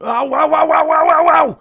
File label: on fire; foreman spike; SM64 CO-OP